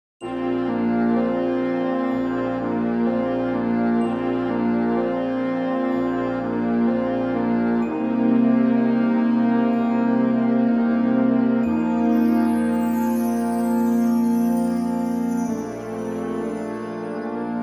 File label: three part flex band